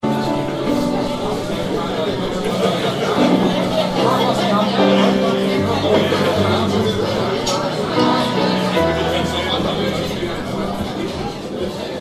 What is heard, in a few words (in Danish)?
Vaertshuslyde; Kroppedal Museum; Alpegården